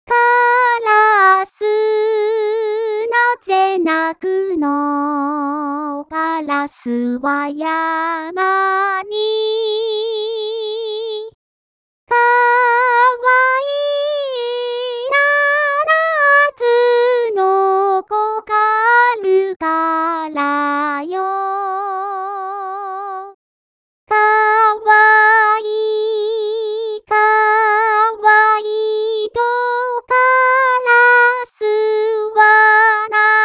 seven children, traditional vocal only

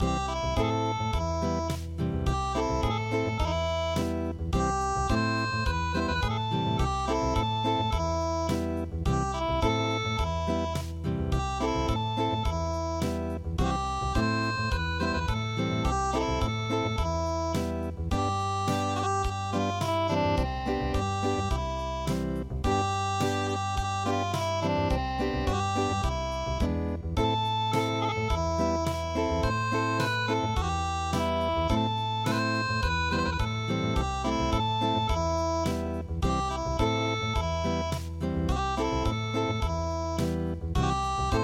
The volga boatmen unpluged

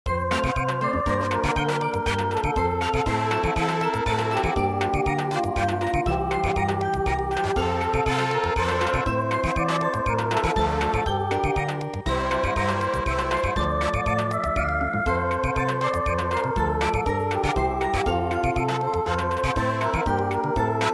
Samba, midi

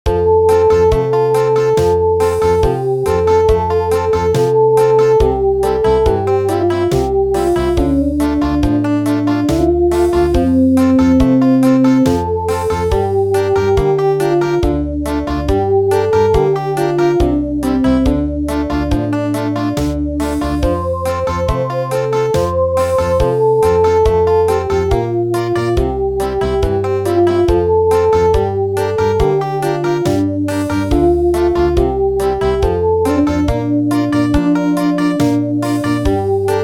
Country

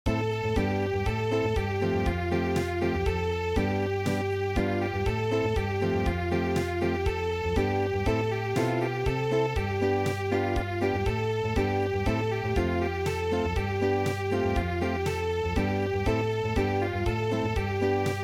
Unplugged

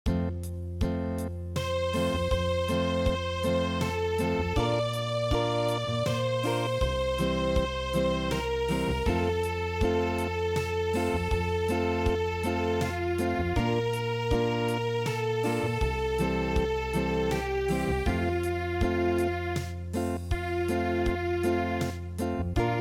Unplugged

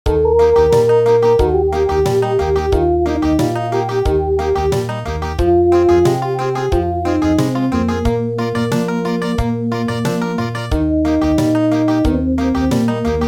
Country

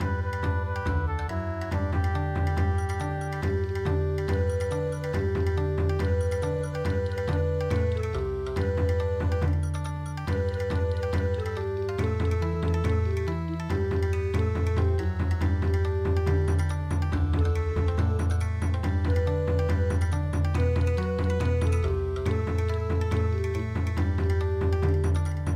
Bon Odori, midi

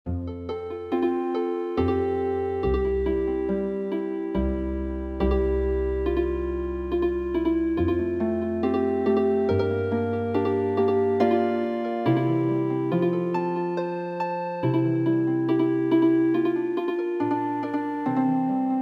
Harp

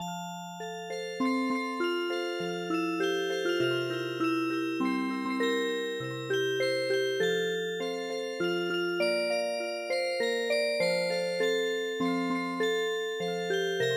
Music Box, traditional

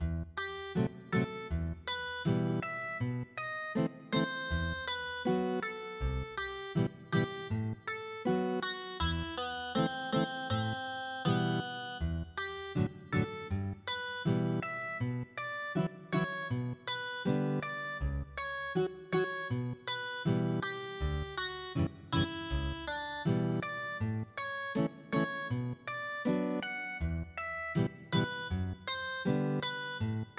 Ethnic Guitar

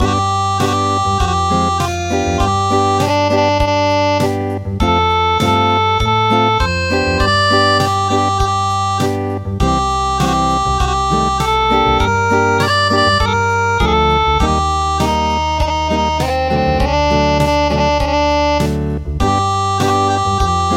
Acoustic, traditional; midi

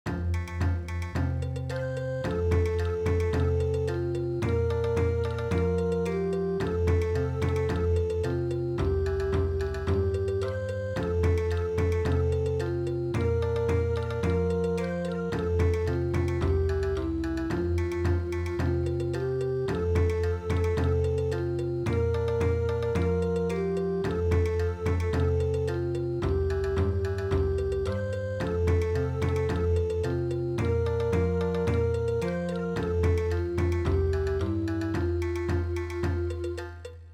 Drum Bon Odori; midi; traditional

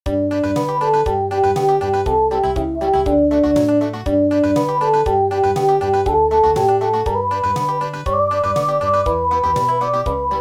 Country; hanasaka

